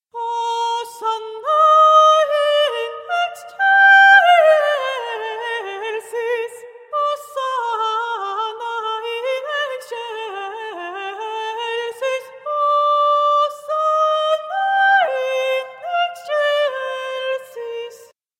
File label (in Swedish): sopran; Benedictus